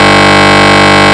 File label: rave beep G1; Best Service Alpha Dance vol.2